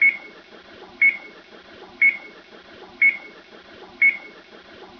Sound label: beep